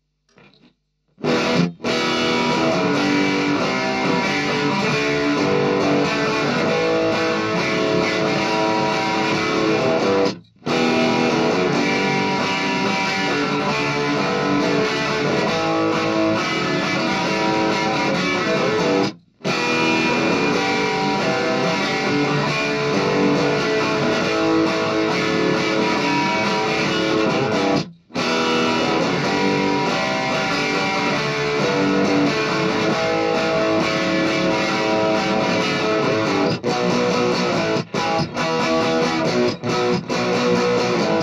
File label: Guitar